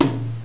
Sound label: r tom